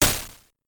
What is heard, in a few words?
Shot